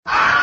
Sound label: wilhelm scream cut off